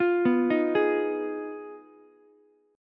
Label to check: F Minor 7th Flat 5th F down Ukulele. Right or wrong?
Right